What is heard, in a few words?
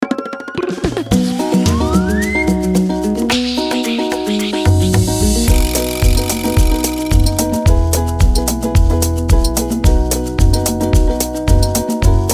instrumental